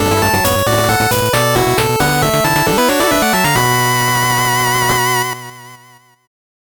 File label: 8bit jingle slow